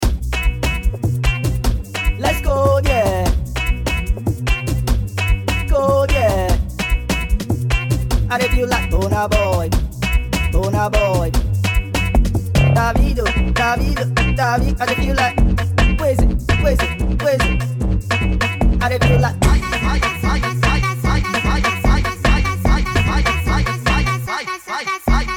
amapiano brazilian phonk sped up

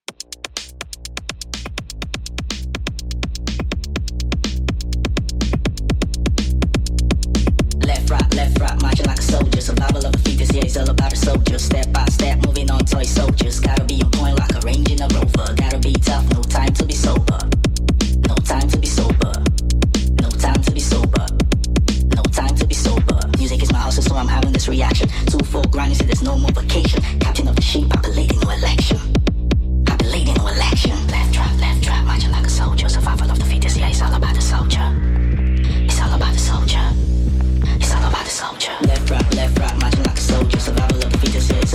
sped up

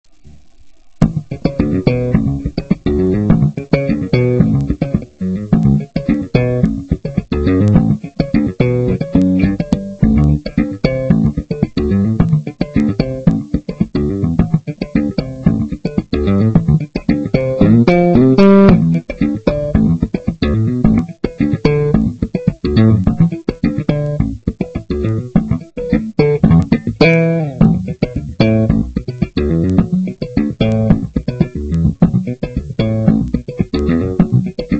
bass solo

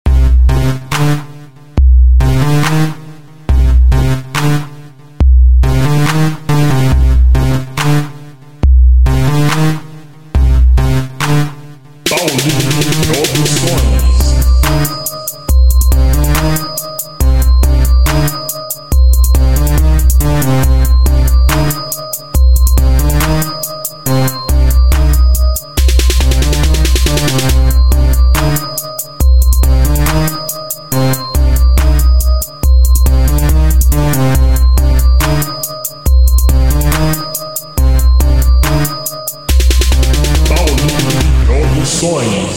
base rap

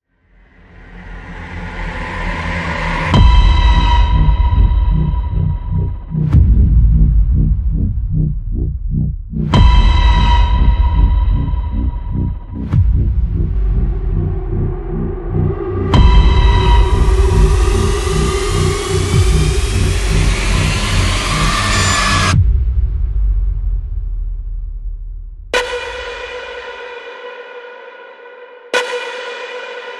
intense horror trailer soundtrack epic dark music

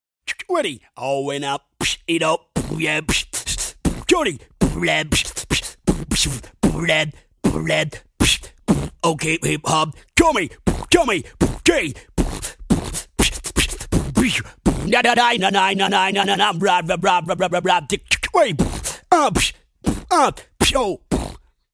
hip hop beat

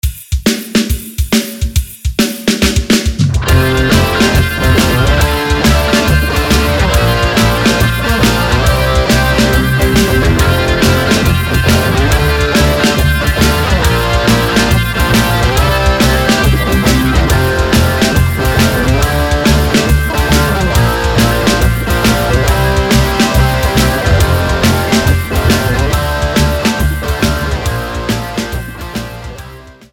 pop

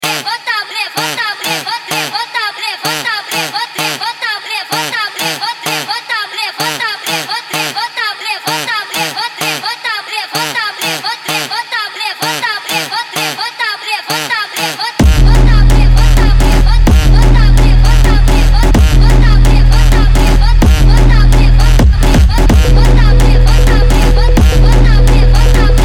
brazilian phonk

